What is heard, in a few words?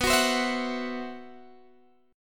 C Major 7th Flat 5th B